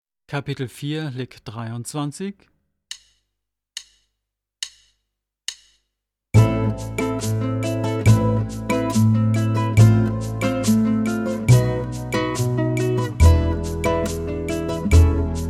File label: Latin Style Guitar